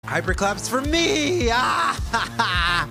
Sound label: hyper claps; raven